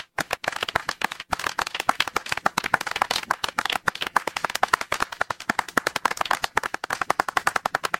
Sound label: crowd applause